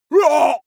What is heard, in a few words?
male shout hit heavy